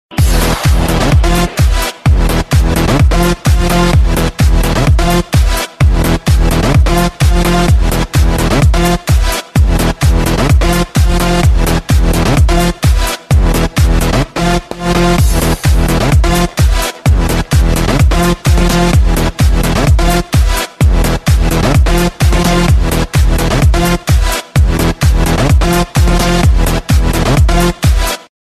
Zen Ringtone

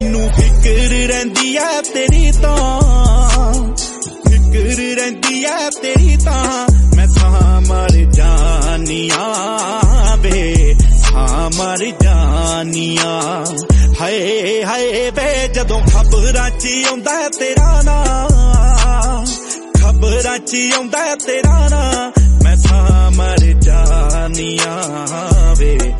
Punjabi Song Ringtone

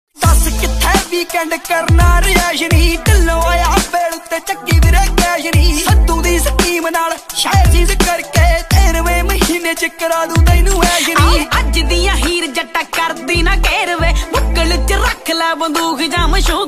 DJ Punjabi Ringtone

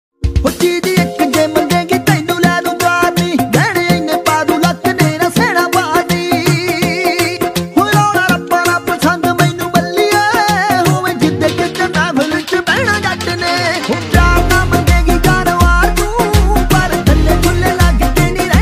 DJ Punjabi Ringtone

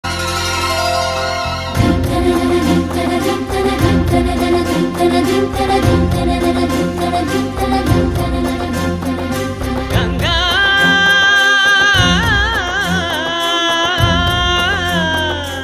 Humming Telugu Ringtone

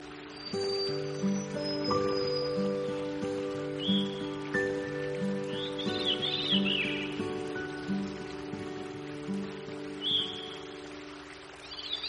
Birds Chirping Ringtone

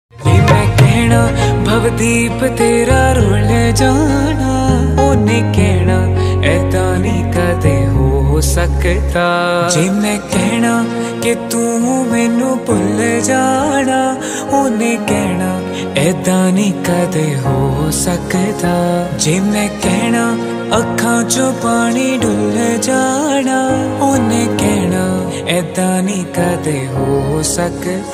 Punjabi Beats Ringtone

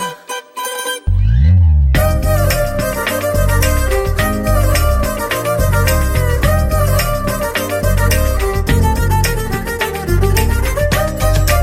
Music Bit Telugu Ringtone

